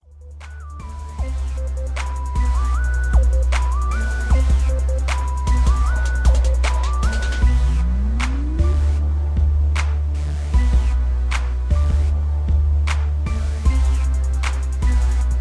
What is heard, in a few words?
77BPM